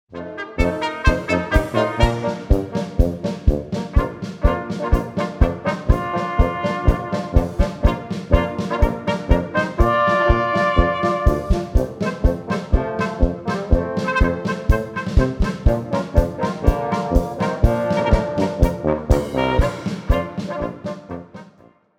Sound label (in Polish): krista polka